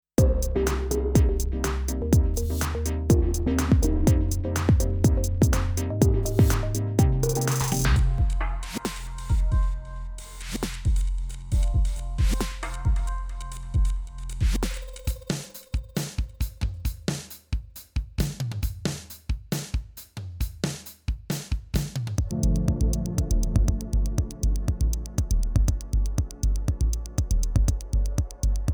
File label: Loops